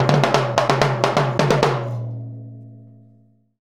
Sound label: Timbale Fill; Sonic Foundry (Sony Creative Software) - Essential Percussion